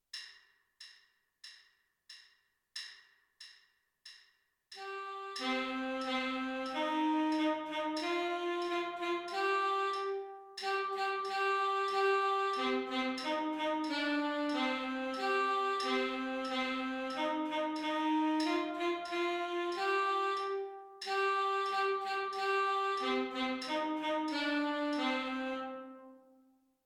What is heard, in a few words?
tenor sax